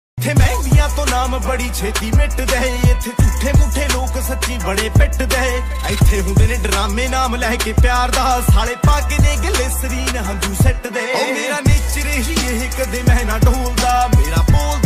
DJ Punjabi Ringtone

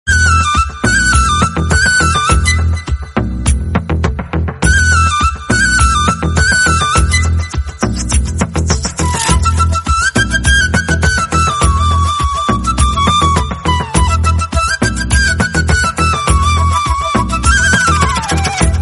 BGM Telugu Ringtone

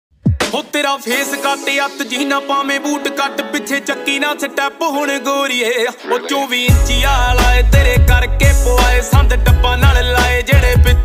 Punjabi Beats Ringtone

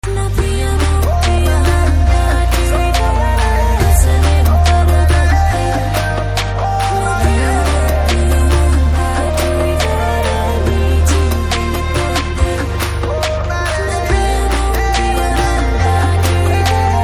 BG Tone Telugu Ringtone

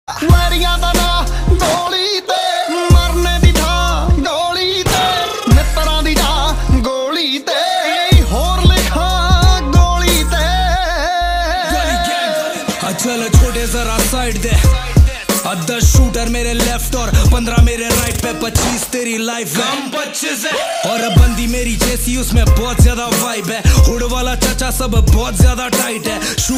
Punjabi Beats Ringtone

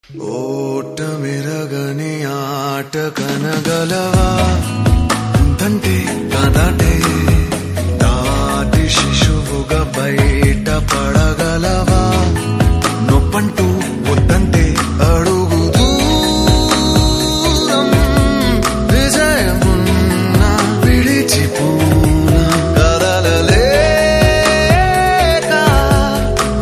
Telugu Ringtone

